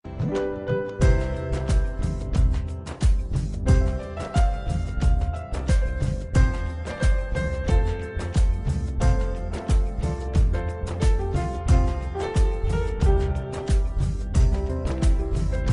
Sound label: beats